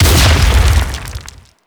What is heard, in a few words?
Brawler Rock Elemental Impact; Warframe; Warframes